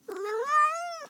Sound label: Cat groan